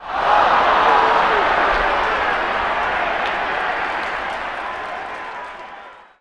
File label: BMX Crowd Reaction "Oh"; Crowds